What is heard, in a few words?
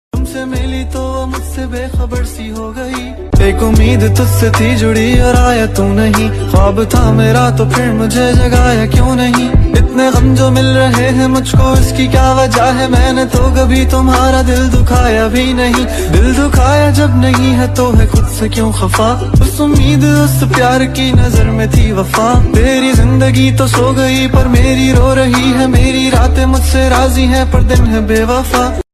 pakistani ringtone